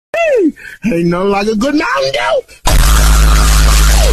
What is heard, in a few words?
AHHHHHH; Mountain dew